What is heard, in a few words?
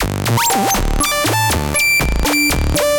Sqeak, Synth; Ableton; Factory Packs; The Forge by Hecq